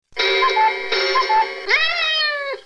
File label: meow